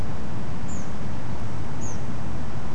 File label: Olive backed Pipit 2 calls